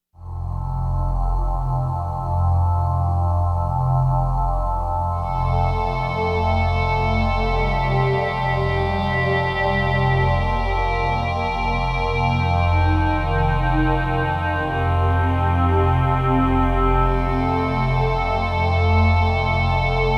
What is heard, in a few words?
Kawai K1 fx pad